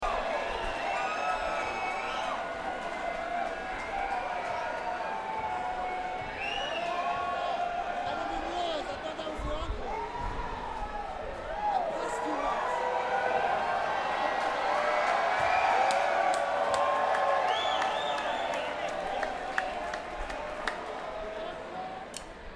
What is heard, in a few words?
Crowd